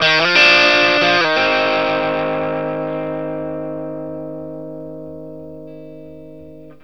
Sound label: BLUESY F 60, Vintage Blues Guitar; USB Soundscan vol.22